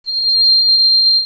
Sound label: trumpet